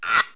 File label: wood comb; Wired for Sound Pro CD; Aristosoft, Inc.